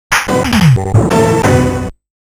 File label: Insert Coin; Darius 30th Anniversary Box